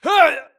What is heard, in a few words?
grunt death quick; Titanfall 2